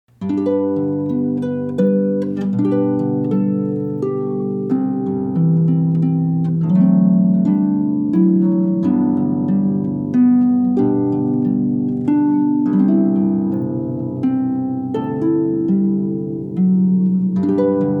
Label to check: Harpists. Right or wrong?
Right